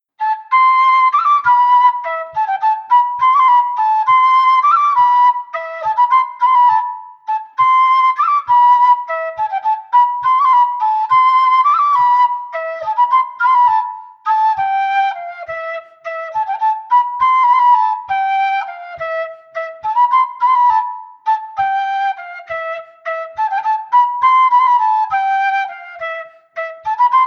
Air de bourree; Revue creusoise

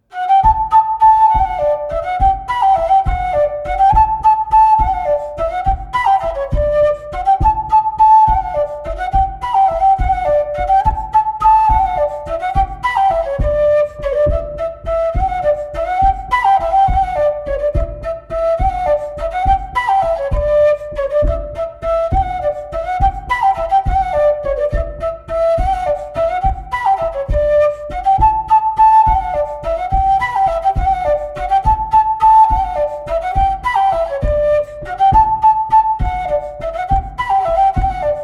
Montagnarde; Recueil Laussedat

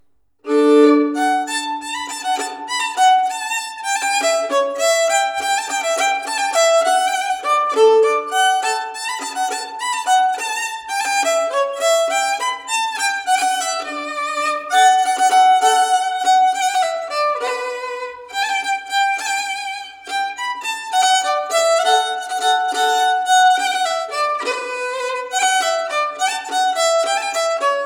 Valse

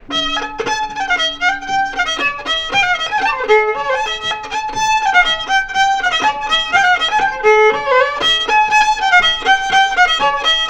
Polka; Polka de Naves

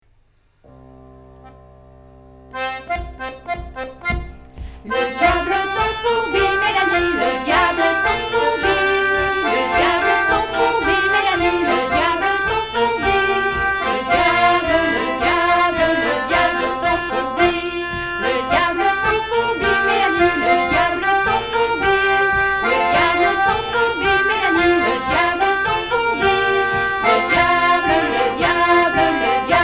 Branle Vx Instr; Diable ton fourbi

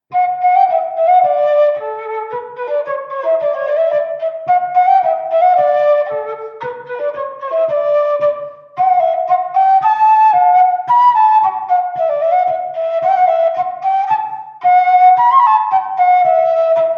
La nouvelle allemande; Ms Dauternaux

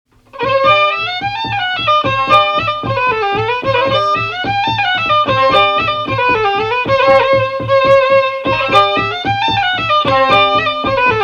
Bourrée; Bourrée Tant pire

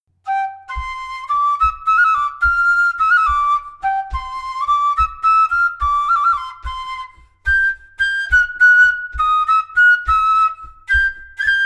Bourree du ruban; Rec Delzangles; Pruliere

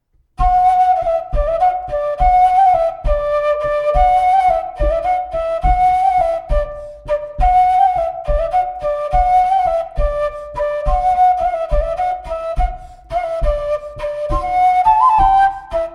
Montagnarde; Recueil De Raoulx

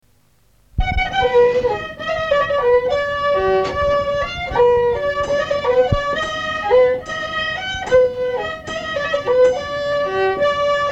valse

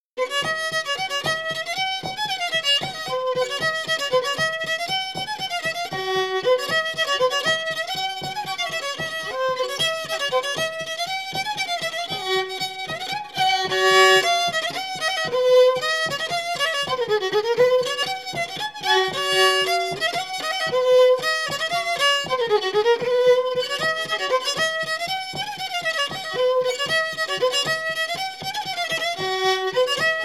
Suite De Bourrées; La Sautarel; Bourrée De Gimel 2

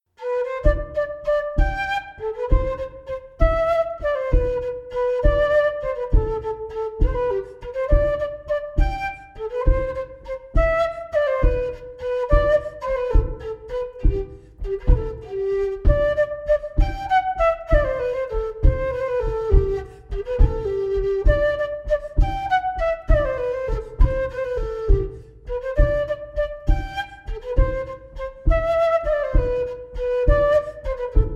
bourree; Coatrieux

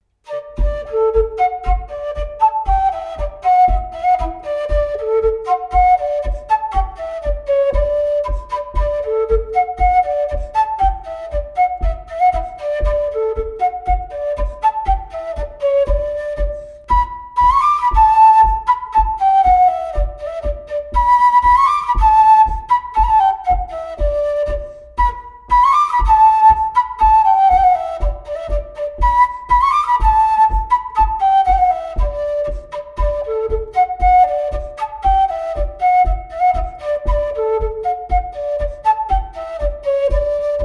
Bourrée; Recueil Lemaigre

